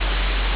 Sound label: ambience room tone